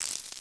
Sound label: Mali Beads; Civ4 warlords